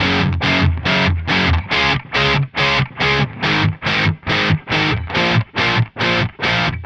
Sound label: Distorted Guitar 140BPM C#maj; Vengeance - Essential Clubsounds Vol.4